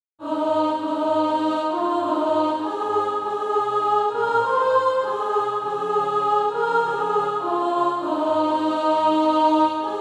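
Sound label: soprano, Practise Music; SATB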